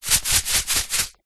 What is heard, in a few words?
seeds packet black chia shake